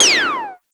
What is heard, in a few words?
Speedy, cartoon; Classic SFX; musicradar; cartoon caper